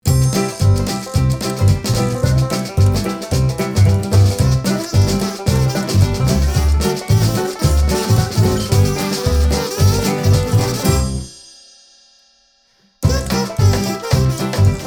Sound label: Kazoo Boogie